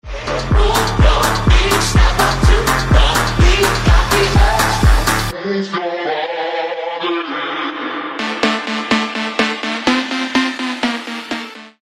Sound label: Phonk DJ set